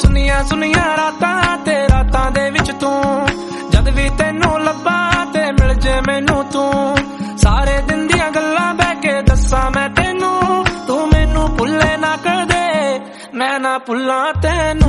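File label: Punjabi Romantic Ringtone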